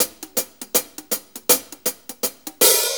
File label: Shuffle HiHats; Sonic Foundry (Sony Creative Software); Drum Tools; Shuffles; HiHat Loops